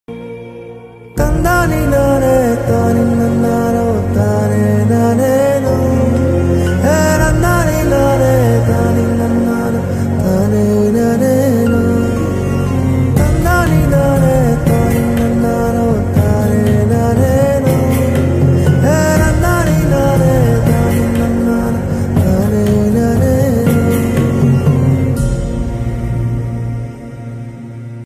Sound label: BGM ringtone, tamil ringtone; KGF Amma